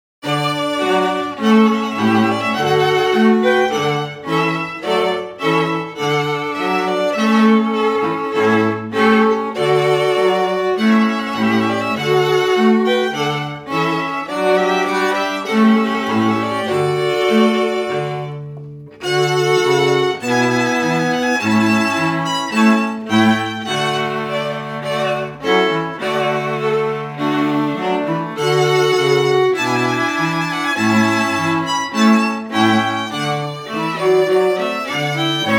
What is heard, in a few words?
Trumpet Voluntary